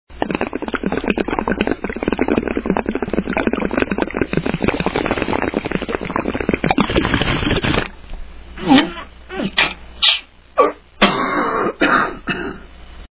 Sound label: bong